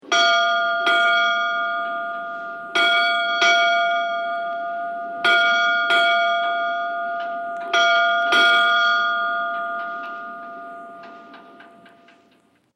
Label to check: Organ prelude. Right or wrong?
Wrong